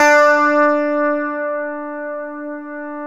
GTR CH STRT, Clean E.Gtr, Ultra Sheen

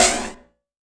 Yamaha CS1x Drums

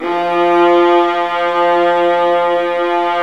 Violas Bright, String Sections; Roland LCDP13

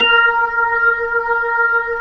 B-3 A#5, Jazz; Keyboards of The 60's and 70's

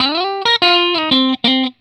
RIFF 120, Vintage Blues Guitar

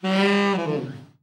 TENOB FALL, TENOR SAX; Best Service; Brass Super Section

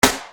Snare Dr

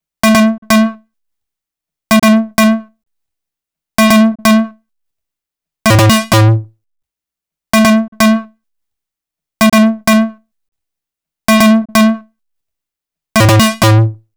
Hard Square Synth, EDM; Sonic Foundry (Sony Creative Software); Afterhours EDM

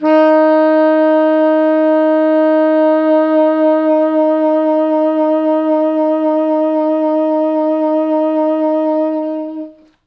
T Sax D#3

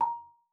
marimba A#5; Xsample VOL-4

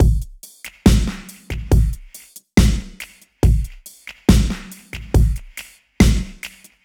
SloMo Beats, Loops 140 BPM

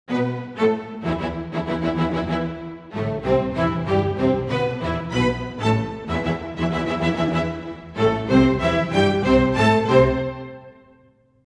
95 e min strings, Orchestral arrangements

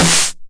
909 SD Tape Sat

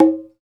CONGA, Roland; Hybrid Kits; Club-50 - Foundations; Thin

